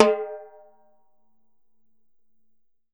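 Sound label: TIMBALE, Salsa Kit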